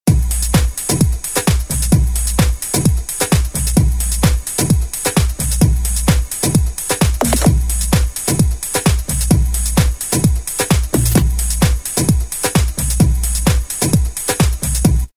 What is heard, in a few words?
jazzy 130, Roland M-DC1